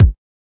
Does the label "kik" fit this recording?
Yes